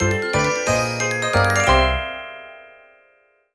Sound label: LEVELUP